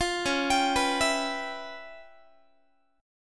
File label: C sharp 7th Sharp 9th Flat 5th C sharp Guitar; arpeggiated; 4A,4E,5A,5D,5G#,6C#; C#7#9b5; JGuitar